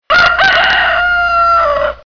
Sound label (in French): coq